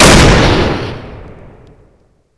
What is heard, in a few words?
sniper fire